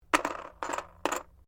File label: COUNTERS PLASTIC GAME DROP; Casino Sound Effects